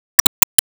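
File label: Sound Effect Bonus Enemy Gimmick; Se Ingame Bonus Enemy Gimmick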